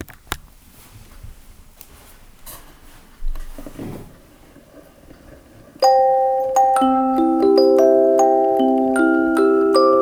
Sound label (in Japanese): 春の足音 オルゴール